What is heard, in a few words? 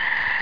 cricket